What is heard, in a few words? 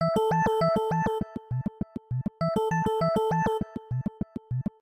Pulse, ringtones; Samsung Galaxy S24; Fun